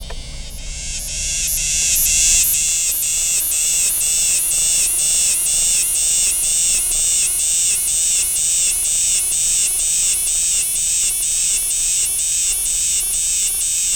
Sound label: Neotibicen similaris apalachicola holotype rec in cage, cicadas; US; FL